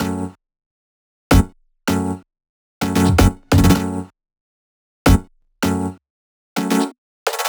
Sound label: 128BPM Melody; Vengeance Samples; Radiate Kit